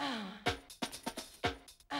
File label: 125, Classic Drum Loops